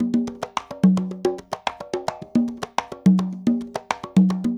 LOOP R, Percussion; InVision Interactive; Percussion Slam; PROGFROG

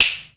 AMB P, HALL KITS; Crypton - DrumBase5000

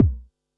Bassdrum, Pearl SC-40

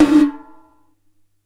Gated Tom; Gated Reverb; Univerb; musicradar

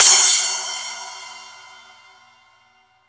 SPLASH; East Collexion; Drum 1 Dry

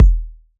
kick lofi; kicks

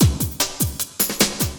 LOOP, Drum & Bass, 150 BPM

